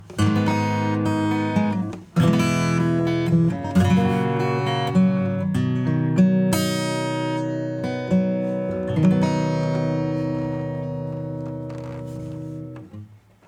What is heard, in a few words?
acoustic; Pulsar